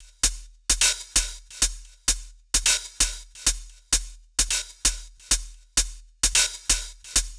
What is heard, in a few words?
Hihat Loop 130; Equipped Music; Loungin House; Hh-Shake Loops 130